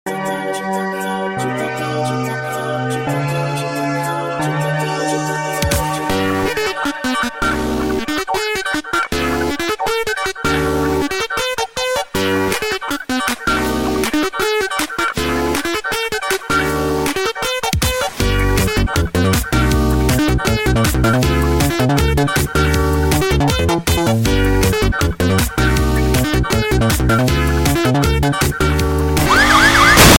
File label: funky piano